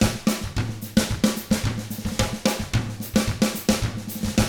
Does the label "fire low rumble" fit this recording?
No